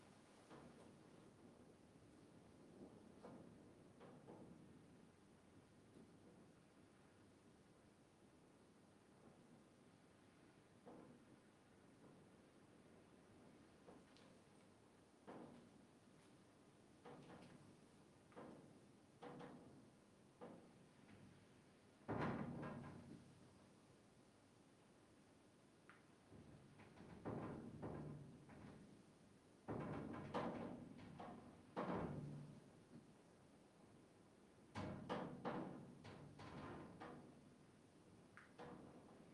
ambience empty garage hum metal gate hitting wind outside scary; ambix; sonniss gdc